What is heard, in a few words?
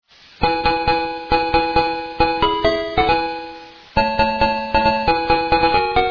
Jingle Bells